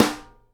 snare GLASS, Live Snares

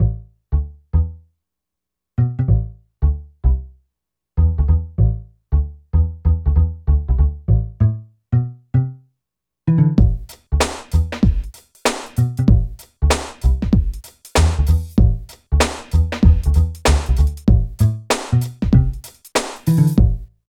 LOOP; East West - Phat & Phunky CD2; 90's Sample CDs

